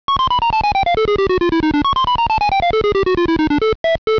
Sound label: door door; ドアドアmkII; V1Sモード：mkII音源; ミス〜ゲームオーバー; ＰＣ８８０１